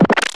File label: SFX Get; hydra castle labyrinth gamerip 2011